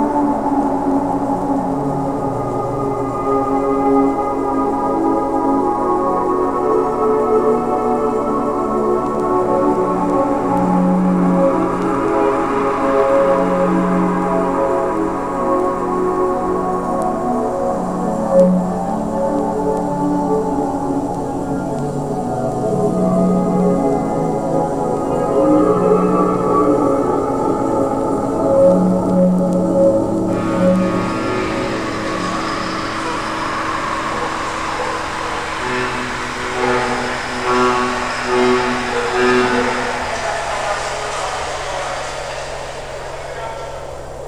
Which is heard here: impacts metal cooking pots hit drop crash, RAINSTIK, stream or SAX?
SAX